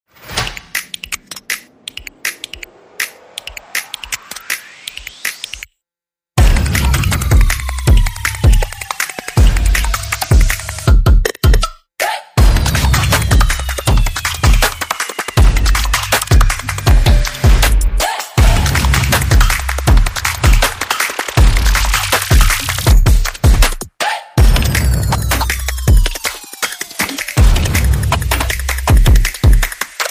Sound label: Fast